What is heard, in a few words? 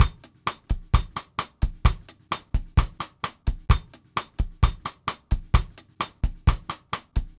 Cha Cha, Latin Percussion, Drumset; Sonic Foundry (Sony Creative Software); Joe Vitale Latin Percussion